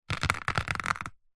nature water drips short sudden onto glossy paper bag